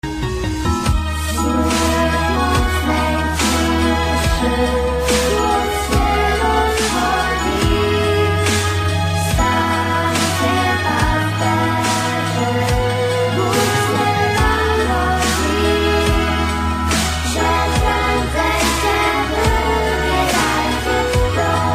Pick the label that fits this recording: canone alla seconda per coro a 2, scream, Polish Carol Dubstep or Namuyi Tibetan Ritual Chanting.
Polish Carol Dubstep